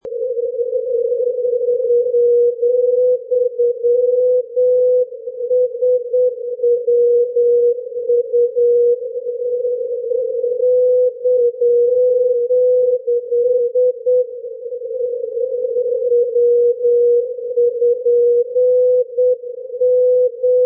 ndb umea; julussdalen-kiwi

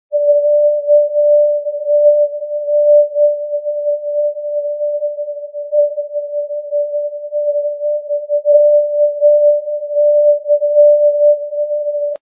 ndb kariatain; syr

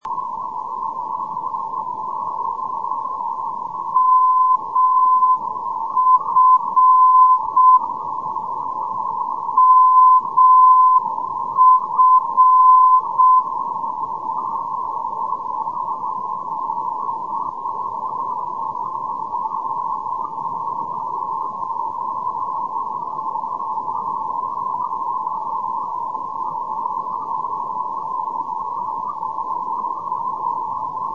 ndb larionovo

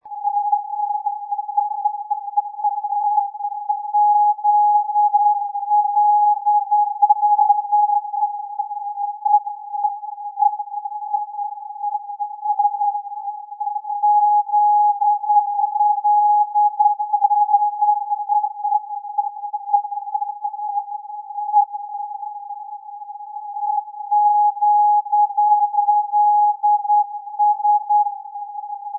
ndb long island cw

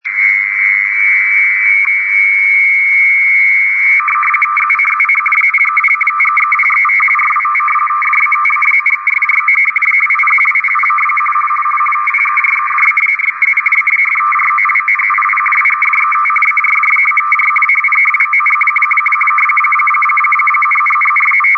meteo rtty 50 bd; arkhangelsk

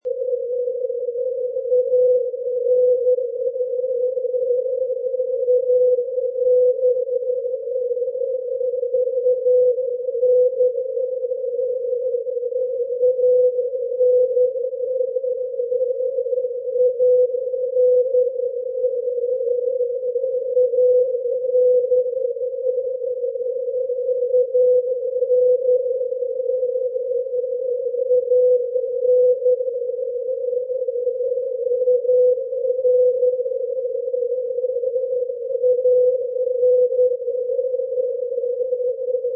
ndb 381; arvidsjaur; europe; kiwi

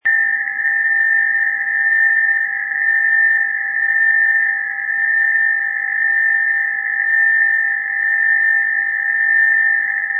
ndb klagenfurt

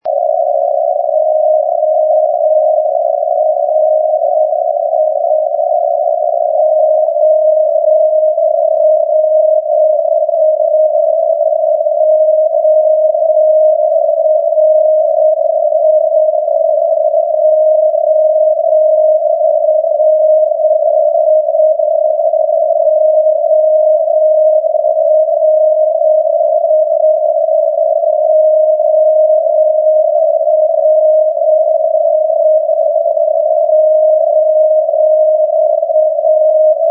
ndb orio al serio ori 376.5

